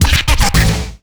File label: goal done; Tony Hawk's Project 8; XBOX